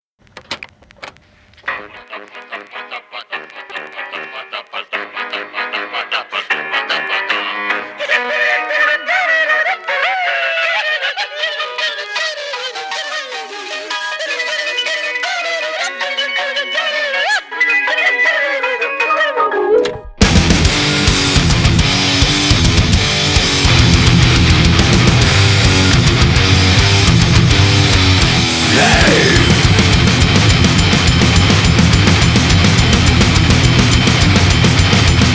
ukranska narodna psnya thrash version; ukrajinska narodna pisnja thrash version; pidmanula

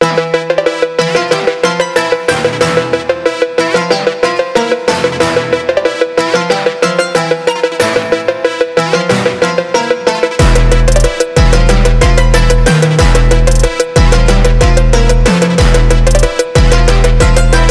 desert phonk trap

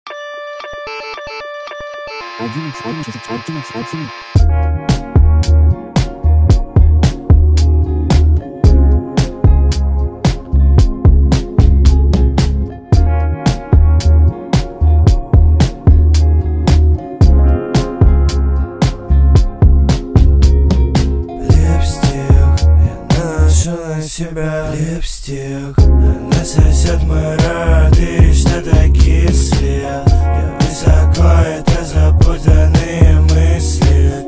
emojazz cover; russkaja pop muzyka

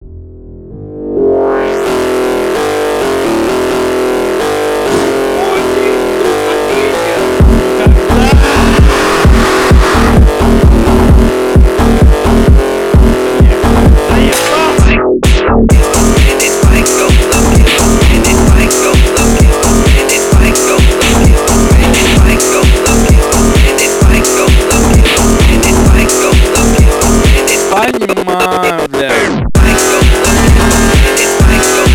phonk house

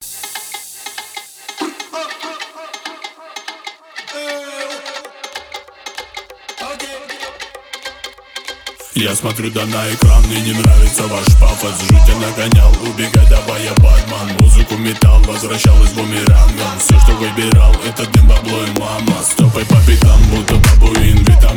phonk

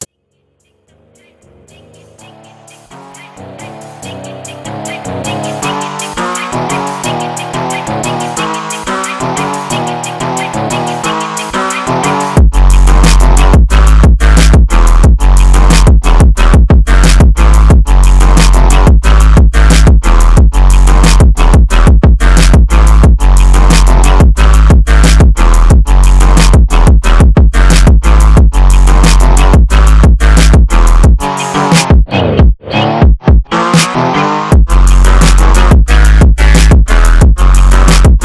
phonk; rjep i hip hop

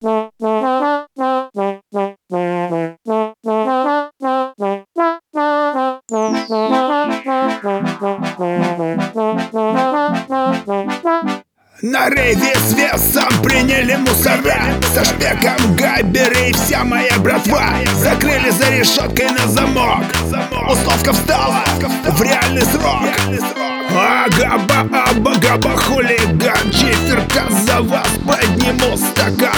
hard bass; gabba

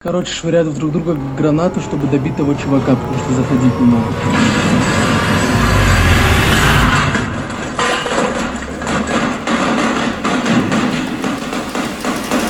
lebanese phonk

